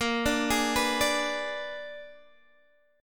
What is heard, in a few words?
Bb 7th Flat 9th Bb down Guitar Standard; Bb7b9; arpeggiated; JGuitar